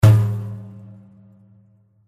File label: daf tom